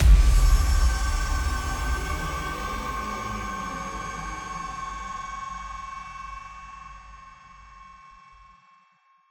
Boss Fatality Music

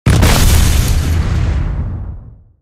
Meteorite Explosion

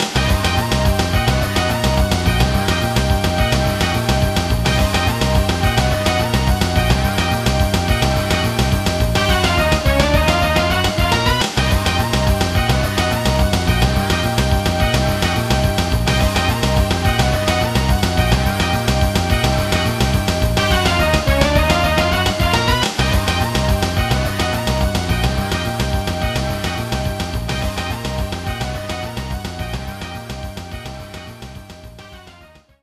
panic mode; Buster Buddies; Capcom Puzzle World 2007; PSP

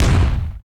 Page; OvenBreak 2; iOS mobile

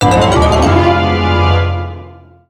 Jingle ~ Challenge Start; mario super sluggers; wii

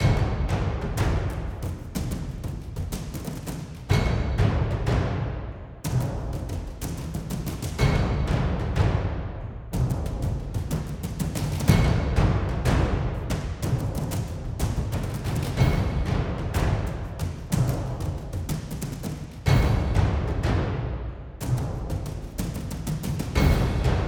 Cinematic Music - High Octane

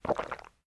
Coffe Big Drink; Still Life; windows

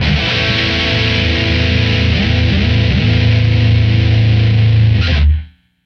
Guitar Power; Back to the Future The Game; Android iOS PS3 Wii Windows Xbox 360 gamerip 2010

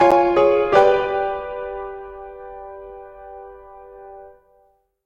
Cabin Stinger

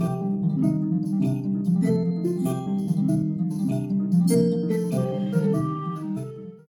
music menu lobby

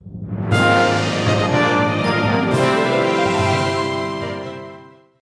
game won; dragonology 2009 nds gamerip

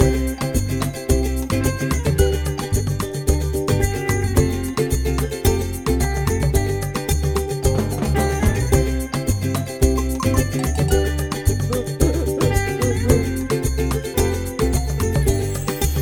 Menu Loop; rakoo friends wii u 2015

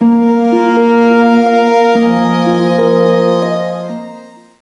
Calm Ancient; sid meier s civilization revolution 2008 nds

